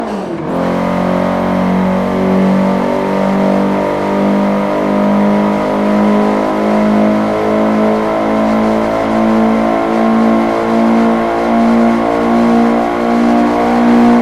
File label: fourth, vehicles cars scirocco